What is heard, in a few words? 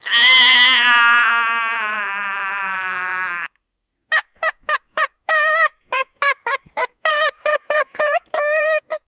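sinister and evil laughing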